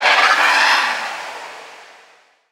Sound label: Diseased Vulture Play